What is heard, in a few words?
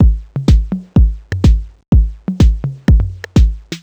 drum loop clip stripped 125, Classic Chicago House; Sample Magic; Drum Loops